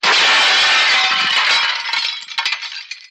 Glass Crashes Large W; windows & mirrors

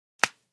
Candy Bar Break Bite

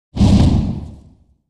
Fireball Quick Flame; fireballs

